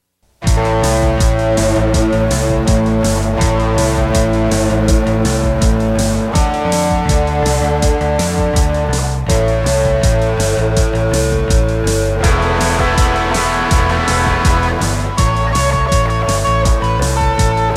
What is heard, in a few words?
Texas Funk